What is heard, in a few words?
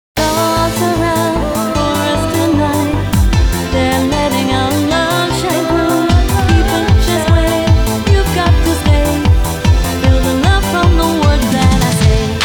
HYPER EUROBEAT; DanceDanceRevolution X; arcade; PS2